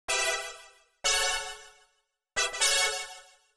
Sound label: 125 Soft pad A, Keys, Nu Jazz House; PowerFX - Nu Jazz House